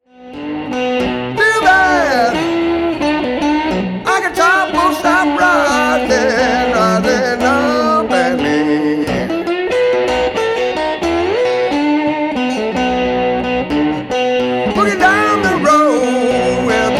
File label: Archetypal Blues